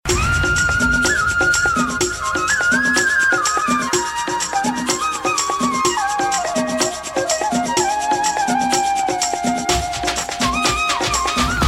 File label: Flute Fast; Gupth